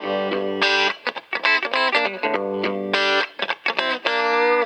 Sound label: GTR; USB Soundscan vol.41 - Roots Funk; AKAI